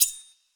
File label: sword